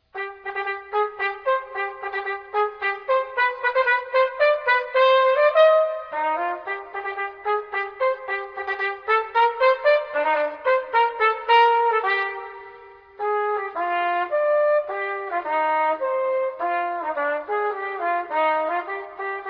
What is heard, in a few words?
Duet, trumpet; Arban